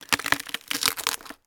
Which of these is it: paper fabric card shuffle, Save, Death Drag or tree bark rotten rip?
tree bark rotten rip